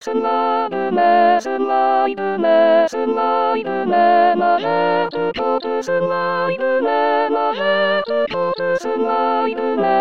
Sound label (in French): mai soprano; 2023 st jean